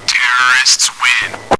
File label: Terrorist Win Game Ringtone